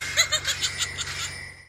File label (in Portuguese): risada panda